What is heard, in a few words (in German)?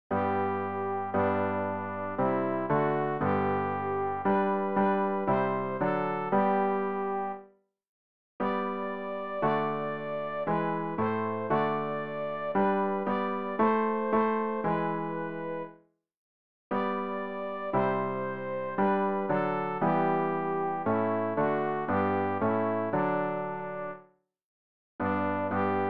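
sopran, Übehilfen; Ref. Gesangbuch; RG 56: König ist der Herr, Völker, gebt ihm Ehr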